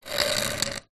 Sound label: metal pole solid drag scrape concrete; foley; zapsplat